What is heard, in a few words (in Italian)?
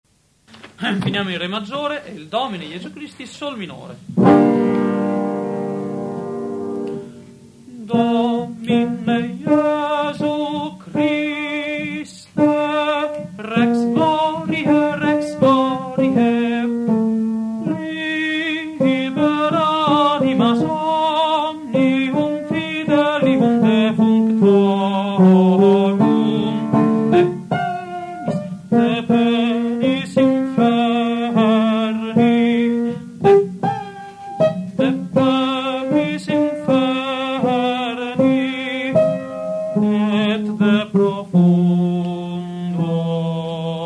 Requiem Domine Jesu tenori; Mozart